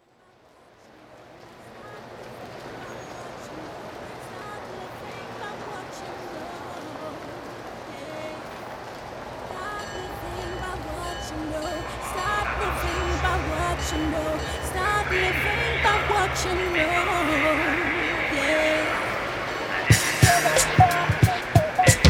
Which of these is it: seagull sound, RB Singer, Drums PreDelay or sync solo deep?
RB Singer